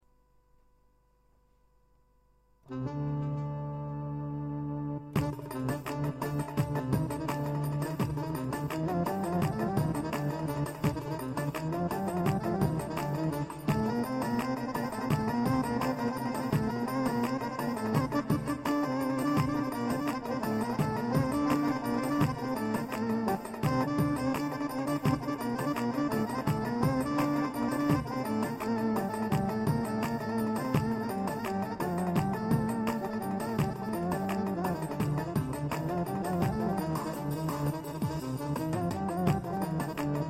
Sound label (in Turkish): kisa ciftetelli, korg pa 55; ses ve ritim demolari